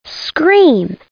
SCREA

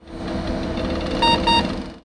mg email

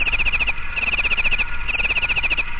grasshopper; fireflies

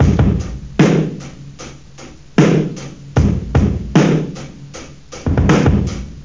bass drum loop; OctaMED SoundStudio V1

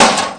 Crash; Ruckus

